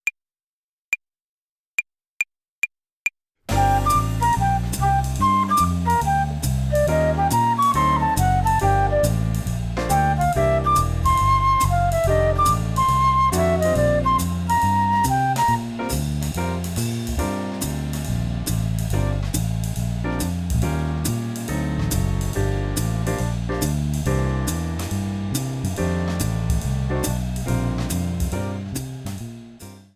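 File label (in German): Swing Phrasierung Übung; play along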